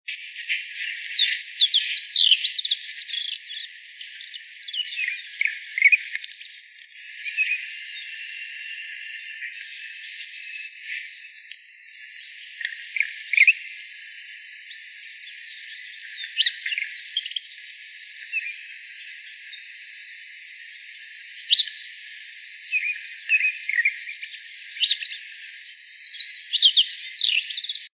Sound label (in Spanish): Tordo renegrido